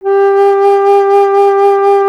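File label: flute, alto flute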